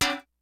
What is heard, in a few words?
HOUSE KIT, DRUMS; Kid Nepro; Elite